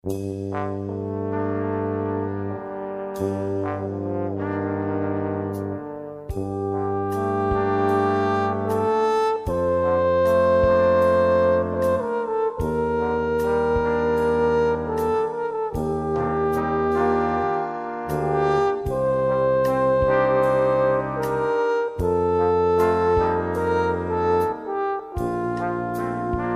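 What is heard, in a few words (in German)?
Bläsermusik Ballade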